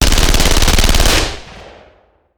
Machine Gun; Sound Ideas; Guns

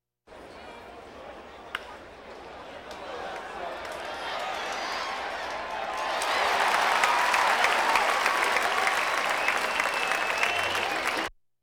Crowds Race cars Baseball

